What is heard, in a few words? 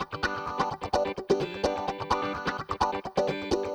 Electric Guitar 128BPM G maj; Vengeance Samples; VEH3 Electric Guitar Kit 2